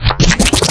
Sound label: FX Scratching; vengeance essential club sounds-3